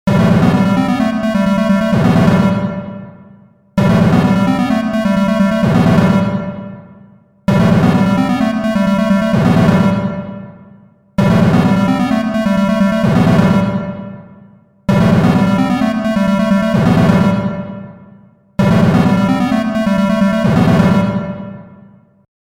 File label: Beepbox